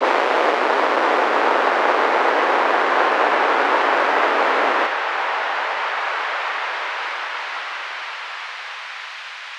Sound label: Particles; Zero-G - Future Wave